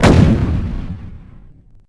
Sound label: heli